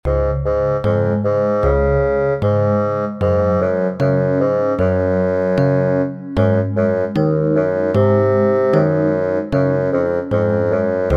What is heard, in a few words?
Owl Theme